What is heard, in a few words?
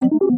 ui randomizer click; Stellaris